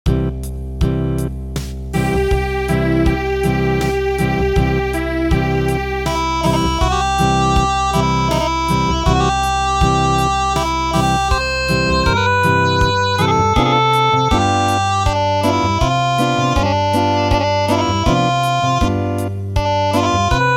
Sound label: Wiegenlied Acoustic, midi traditional